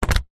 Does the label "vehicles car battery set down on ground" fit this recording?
Yes